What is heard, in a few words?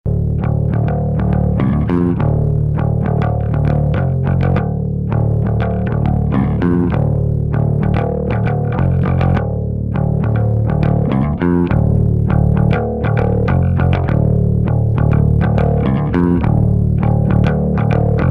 bass amuser dirt